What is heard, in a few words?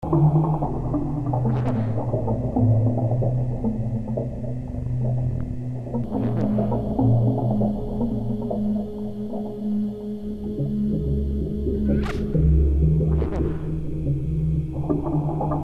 dreamy; nelson; dreamaphage